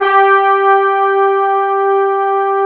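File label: mellow section G4; MELLOWSCT; Synth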